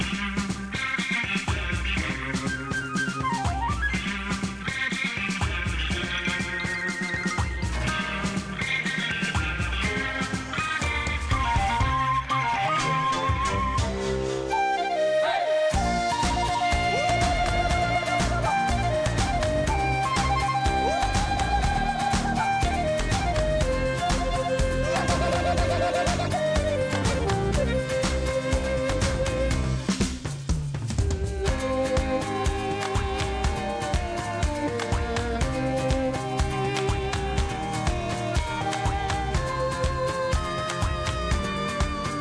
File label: Dual Arena Lobby